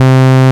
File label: MONO POLY